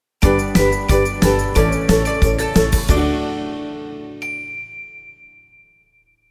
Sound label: corporate inspiring and uplifting sting version